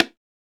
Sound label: PRC BONGO, Roland; Northstar - Drumscapes Roland; Bonus Perc